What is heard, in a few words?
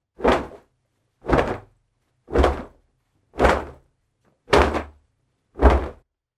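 towel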